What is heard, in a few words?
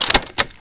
Inf Weapons Foley 3rd; Red Orchestra 41-45